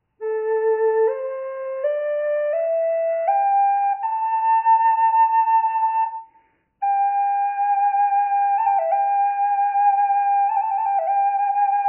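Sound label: reverb, flute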